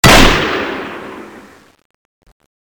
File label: violin gun shoot